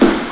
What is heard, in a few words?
Basketball